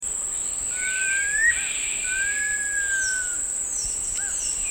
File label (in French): tamatia barre, chant